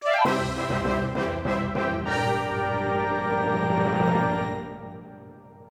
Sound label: Quest completed